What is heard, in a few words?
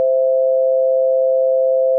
quantization noise; STEGOSAURUS-WRECKS